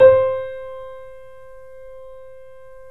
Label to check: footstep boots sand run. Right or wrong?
Wrong